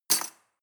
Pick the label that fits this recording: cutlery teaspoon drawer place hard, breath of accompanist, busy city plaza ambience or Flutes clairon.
cutlery teaspoon drawer place hard